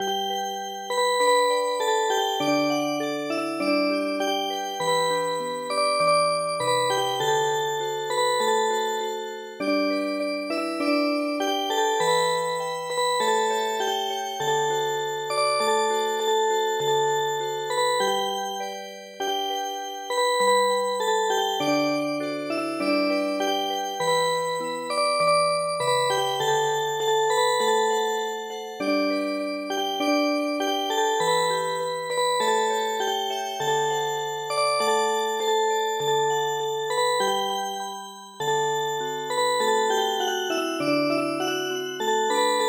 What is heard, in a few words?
winter night Music Box